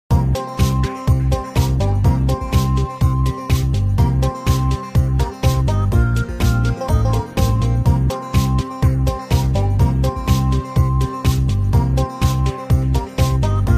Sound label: menu; Old brawl stars